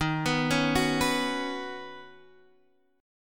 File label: Eb Minor 7th Sharp 5th, down, Guitar, Half Step Down